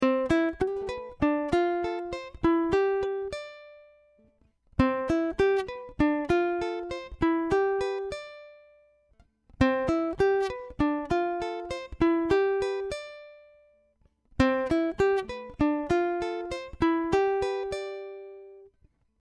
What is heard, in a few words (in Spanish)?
C Escala